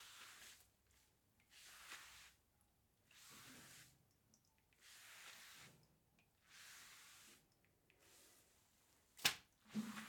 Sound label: Mopping The Floor